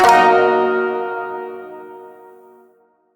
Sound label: Shawzin Blues Chord; Warframe